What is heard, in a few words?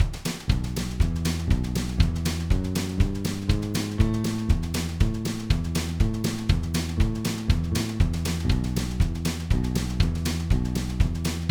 honky tonk solo in e; backing tracks